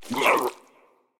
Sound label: minion death grunt; smite; npc; roman archer